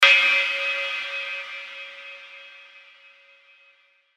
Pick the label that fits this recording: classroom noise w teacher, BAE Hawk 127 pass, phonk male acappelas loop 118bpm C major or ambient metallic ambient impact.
ambient metallic ambient impact